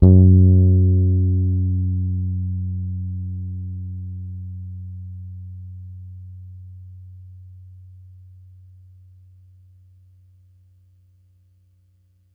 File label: Es F#3 hard, Bass, Pedulla Thunderbass; Giga Samples Collection